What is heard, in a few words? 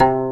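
gayageum C3, Korean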